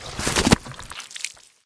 Death Splat; smite; god bakasura

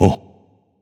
notice; danzaburou

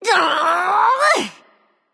grunt lift; discordia

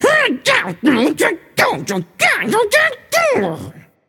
grumble, voicepack; smite; jorm

